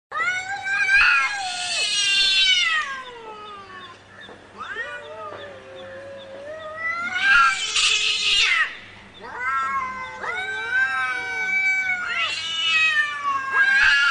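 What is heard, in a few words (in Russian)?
draka koshek, cat fight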